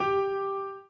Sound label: Piano Chord